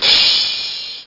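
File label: crash cymbal; Drum kit 2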